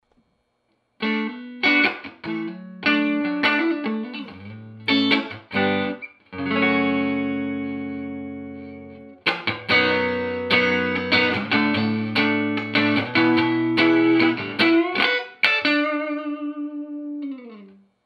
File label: clean